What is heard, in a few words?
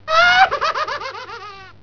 ahhahaha